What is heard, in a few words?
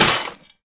Bolts; peace death 2017 pc